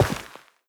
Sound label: step, footsteps, player, carpet